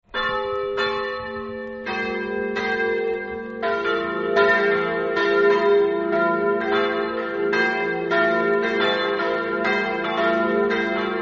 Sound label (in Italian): morsano, campane